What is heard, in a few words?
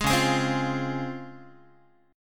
C sharp Major 7th C sharp Guitar; C#M7 strummed; 11,9,11,10,9,x; JGuitar